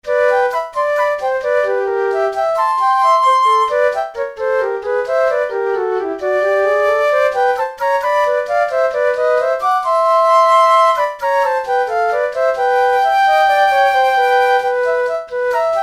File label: Spring for flutes